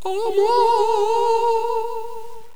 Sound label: minarett; megaglest